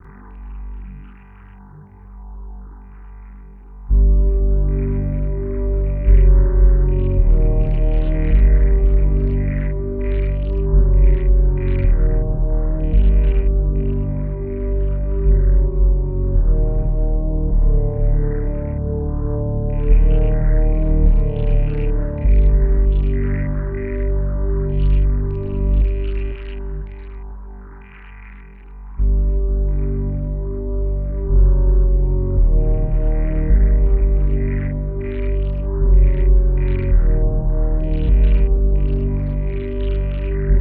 Temple Underwater Theme

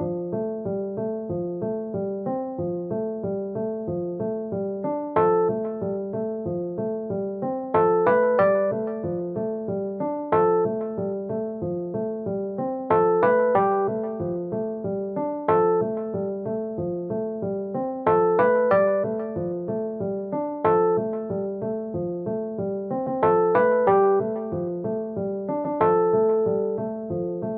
menu music loop; tiggo

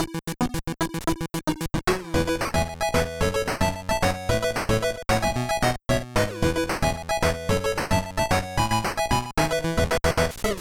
main screen trailer

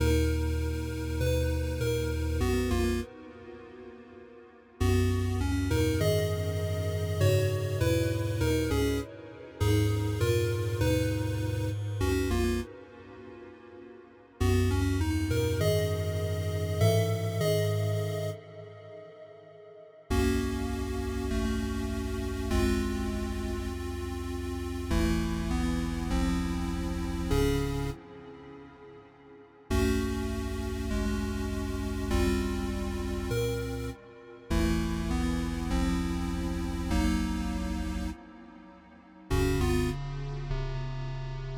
8-bit dungeon

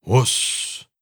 effort grunt male

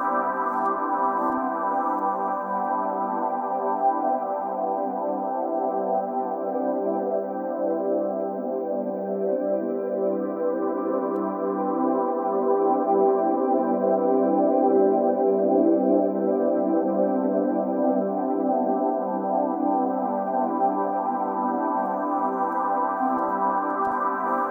Ambient Loop

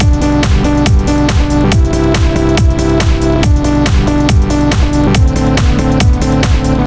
melodic loopy edm

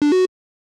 item pickup

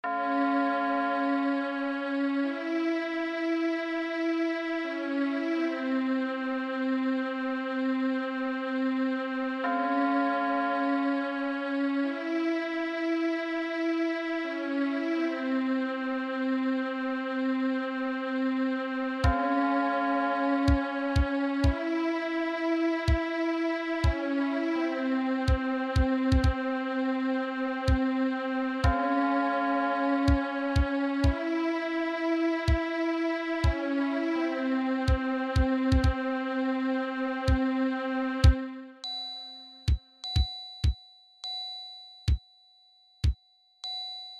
Dark sneaky ambient

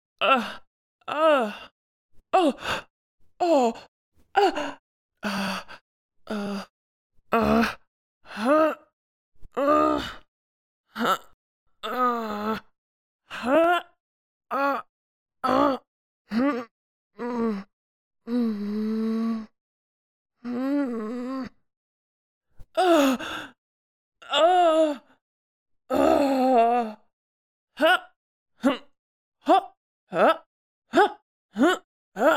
FEMALE Gruntwork

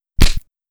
punch

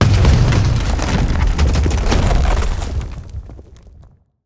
cannon hit

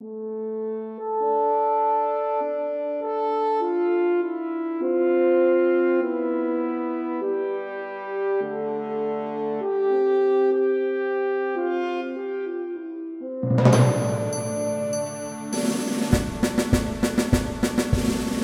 wardrum

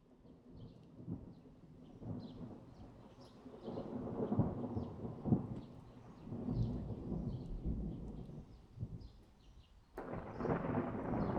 free thunder ambience; Gregor Quendel

